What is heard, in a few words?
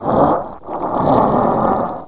scrm; The Elder Scrolls III - Morrowind